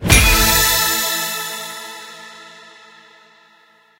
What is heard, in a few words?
scatter Hit; rome